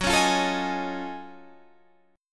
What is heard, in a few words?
F sharp Minor Major 13th, Guitar, Standard; strummed